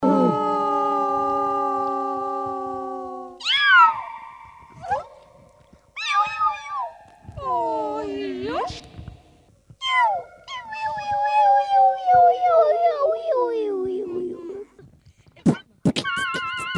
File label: beatbox